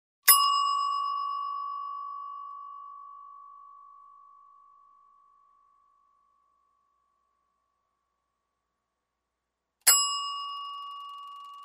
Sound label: bells